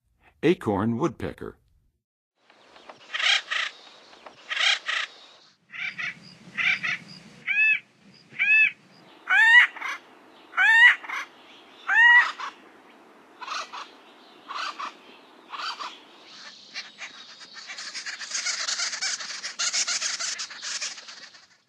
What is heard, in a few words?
Acorn Woodpecker, Songs of Birds; North America; Western USA; Western USA Songbirds